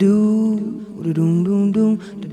Vocal Echoes

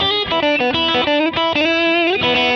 Lead Boogie E; Loops

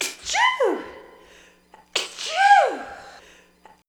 Cute Sneeze

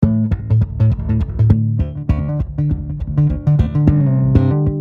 Bass Slap A, D 100

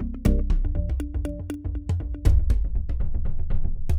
Mallimban C7 120

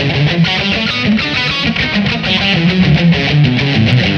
lead, 100

